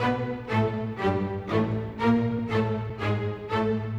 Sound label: Strings; Sonic Foundry (Sony Creative Software); Orchestral VOL-2; Modern 01